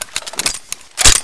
gatling boxin

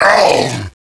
secret hit; cstrike

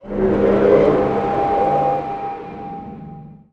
Waves; cstrike; War3

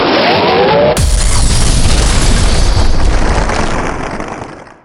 Explode

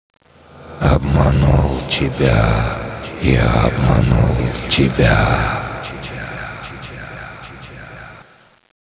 zombie idle; zombie plague

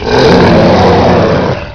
pain

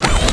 nanosuit strength hit

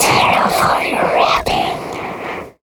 Stalker Infected, zombie; zombie plague

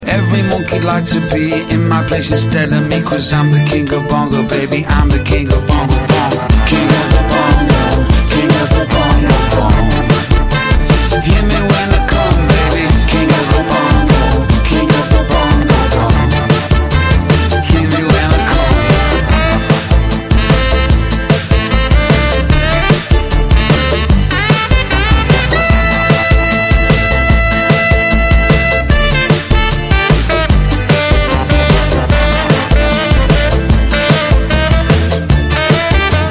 bongo

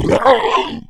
zombie die; zombie plague; cso

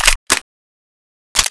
m4a1 clip in

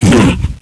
big hit; cstrike

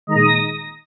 item extra hp

